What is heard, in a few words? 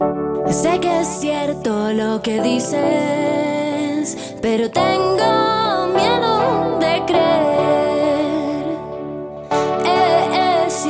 okarina; sol